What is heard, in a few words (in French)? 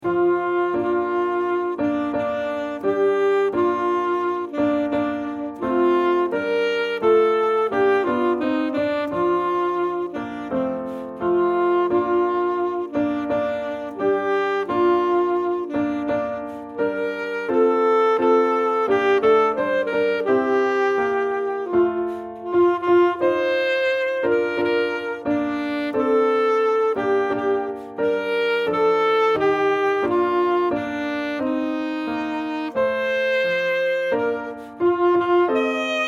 priere patriotique sax; fete nationale